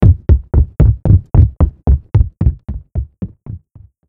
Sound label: footsteps